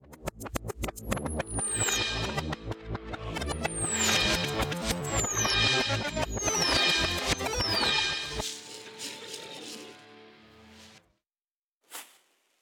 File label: Time Machine Animation